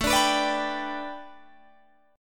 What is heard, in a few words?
A 9th Suspended 4th, Guitar Open G; chord